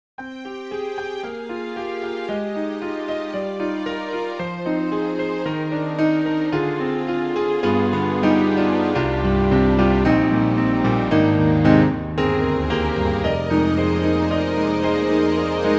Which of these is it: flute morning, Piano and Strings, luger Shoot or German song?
Piano and Strings